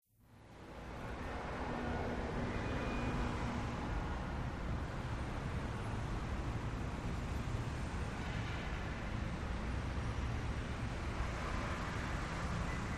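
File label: High Angle City Traffic, big city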